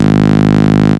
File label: Synths G#; Vengeance Samples